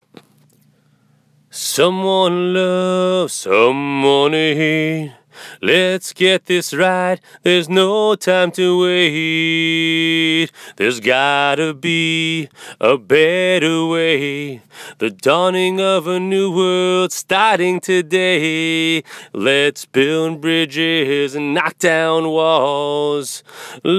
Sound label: Chorus Melody